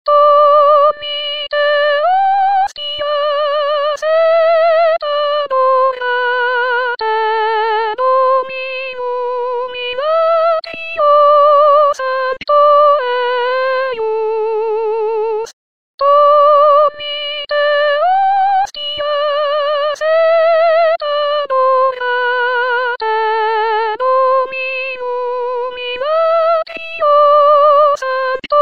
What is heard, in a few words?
artificial soprano; NOEL; tollite hostias